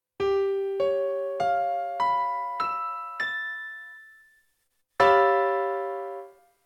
Mystic harmony

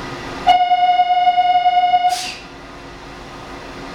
door buzzer north door close

